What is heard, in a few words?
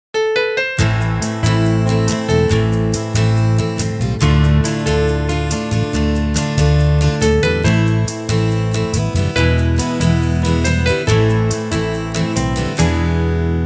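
Song, Portuguese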